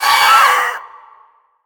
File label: Raptor attack